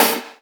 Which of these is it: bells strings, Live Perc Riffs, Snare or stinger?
Snare